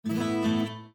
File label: Windows Battery Low; Windows 7 Sounds; Afternoon